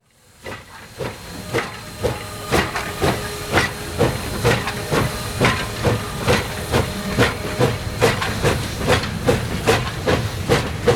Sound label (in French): locomotives a vapeur; sonotheque